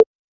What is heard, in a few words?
touchscreen click; openmoko sound theme standard